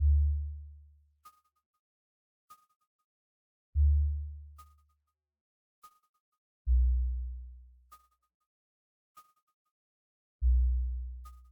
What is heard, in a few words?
pulse